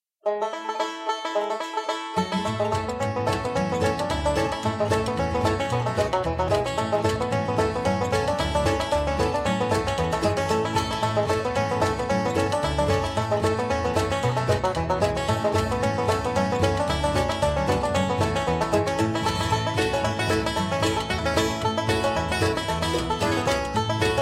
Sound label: Banjo Hornpipe